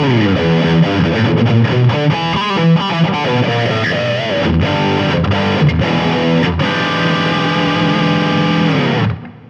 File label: Gtr Mics Mix, guitar mics